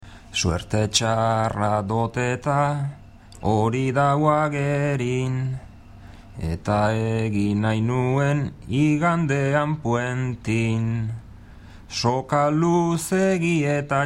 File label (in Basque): bertsos